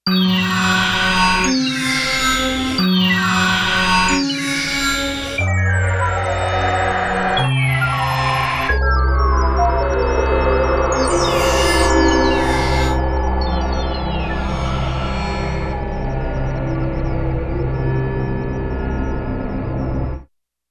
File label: Gated FX; Digital Synthesizer